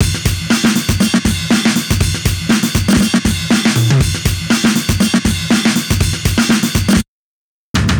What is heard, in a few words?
drum